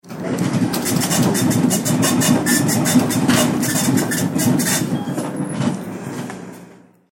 dot matrix printer sound effect

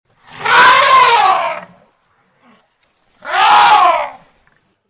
elephant noise

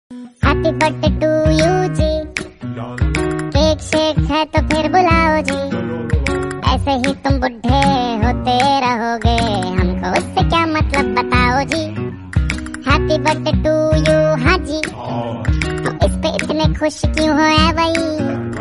Funny Happy Birthday Day To U Ji Song

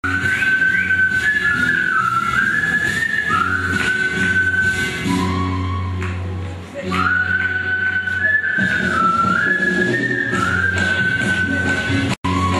whistle

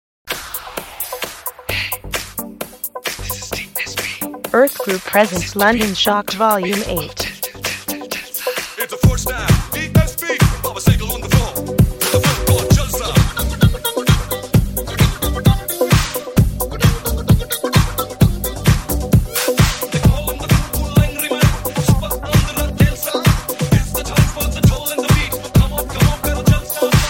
jalsa remix ringtone

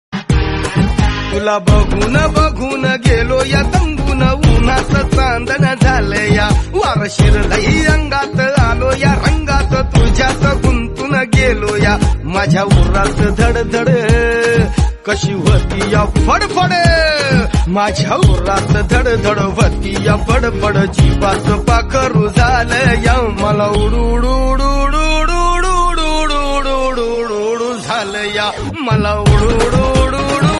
Marathi Song

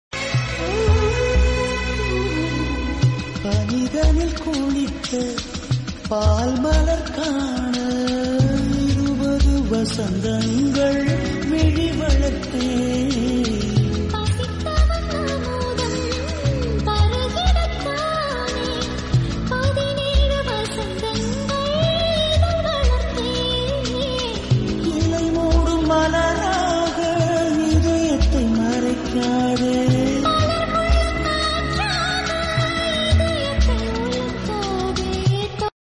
Tamil Movie Song

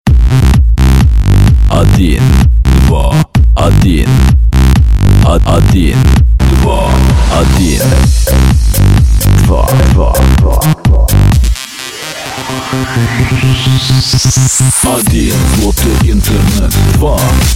dance song

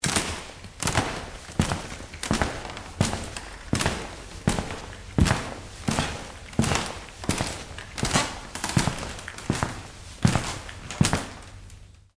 footsteps sound effect

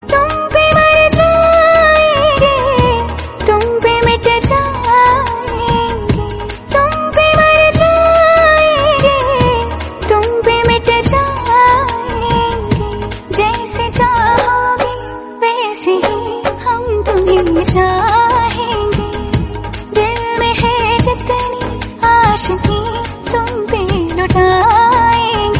Hindi Song

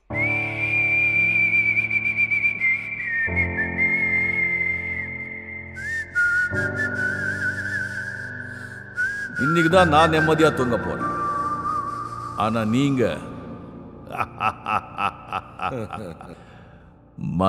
whistle theme

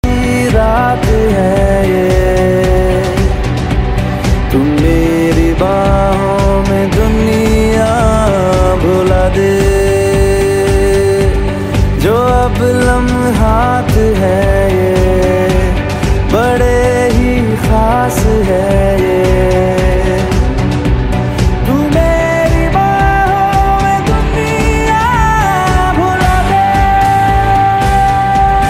ringtone; shivaay

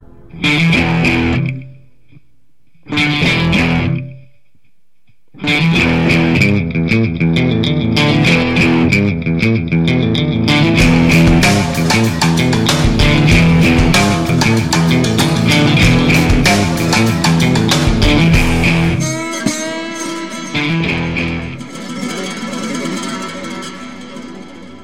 Guitar Supernatural Music

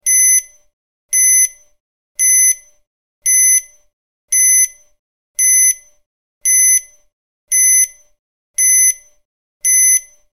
bomb timer

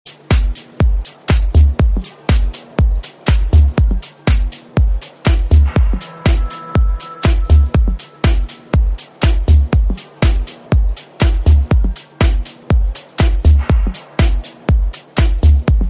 silence mix

